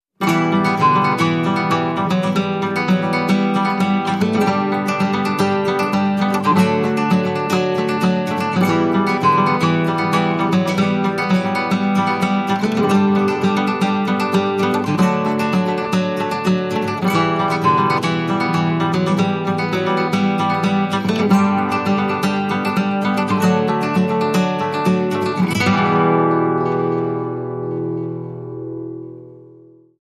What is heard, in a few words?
Spanish Nights, Acoustic Guitar Only